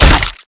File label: rifle swing hit infected, melee; weapons